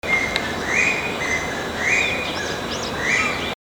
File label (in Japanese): kojukei